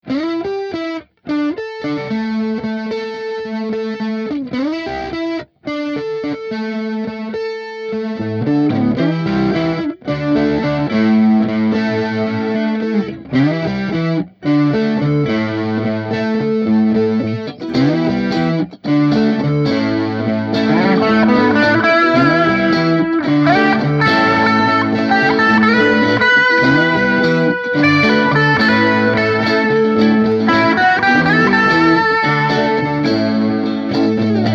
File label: rock riff